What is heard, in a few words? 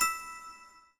win Count Up End, slots; king of slots